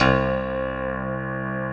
DIGITAL ASIAC; Greytsounds; Scott Peers Sound Engineering

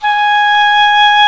G#5 OBOE; ORCH.COMP

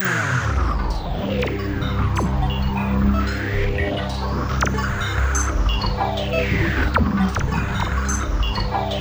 TRANCE, SynthLine; 90 s Sample CDs; NiceBeats; SynthLine VOL-2

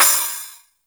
Cym; Roland R-70

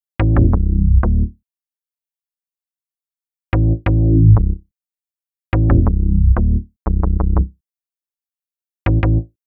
Synth Bass

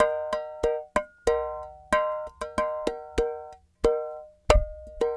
clay pot, world percussion

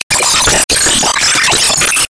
loop, techno atmos

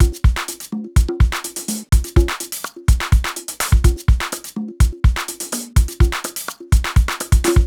top full, 125 Beats

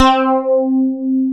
SYN, S CLAVI

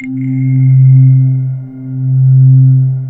VOXIES

